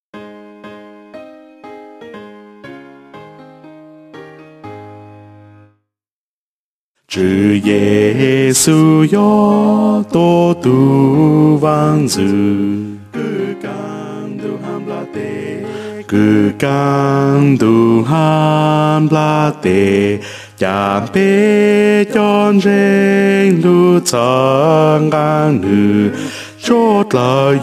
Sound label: bass